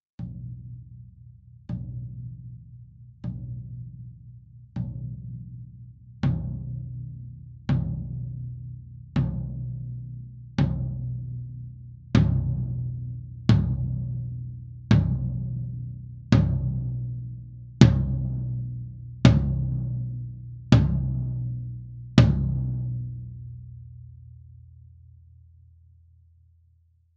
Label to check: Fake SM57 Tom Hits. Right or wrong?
Right